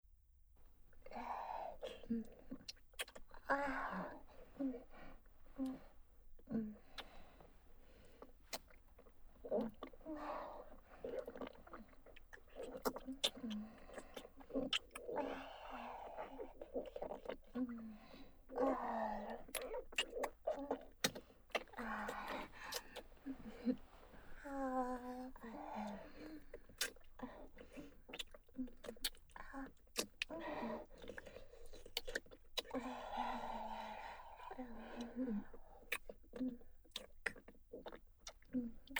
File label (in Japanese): 転校生姉妹のWフェラループトラック, ASMR; 銀髪転校生姉妹